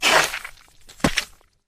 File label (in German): build deich